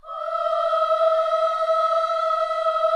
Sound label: KIDS CHOIR, Gospel; Spectrasonics; Vocal Planet